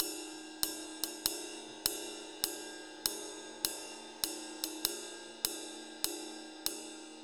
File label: 6/8 Loop, Drum, Laid Back; Sonic Foundry (Sony Creative Software); Loops & Samples Drum Tools